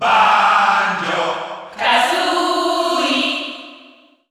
Cheer Spanish; Banjo & Kazooie; SSBU

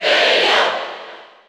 Cheer French; Bayonetta; SSBU

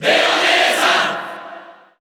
Cheer Japanese; Bayonetta; SSBU

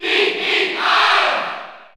Cheer French; Alph; SSBU

